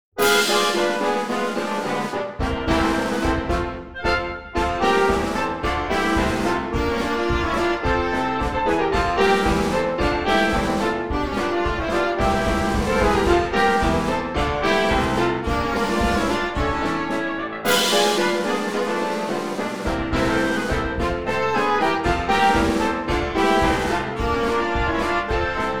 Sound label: thunderbolt blues; ragtime era